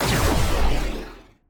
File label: railgun; Mindustry